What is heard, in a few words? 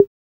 CR-78